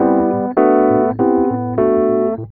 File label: E.PIANO, KEYS